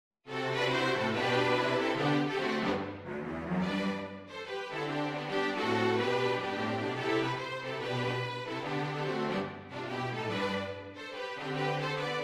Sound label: string; Deck the halls